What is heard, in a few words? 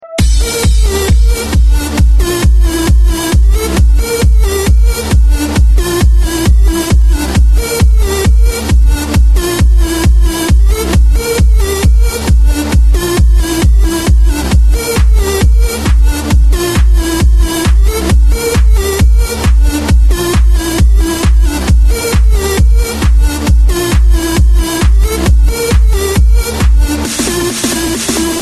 Electronic Power Engineering Club Bass Beat; Electronic Power Engineering (EPE); Новый год; electrohous